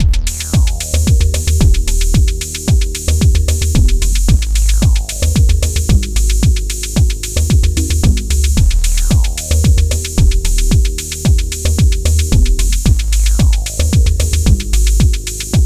Logdrum Bass line with Drums and Synth Bass 112 bpm